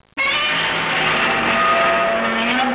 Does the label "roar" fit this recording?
Yes